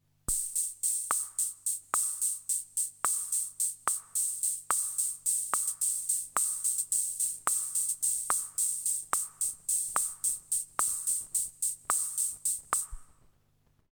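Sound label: TR 77 decay room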